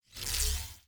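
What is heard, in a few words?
attack electric spark laser cast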